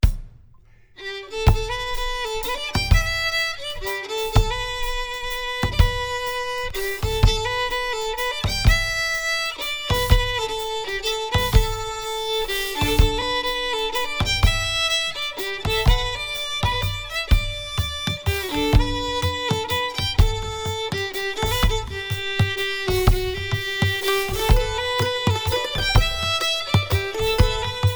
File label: Waltz